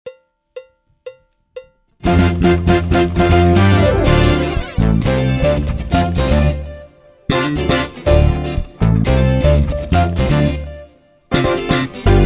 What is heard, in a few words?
drumless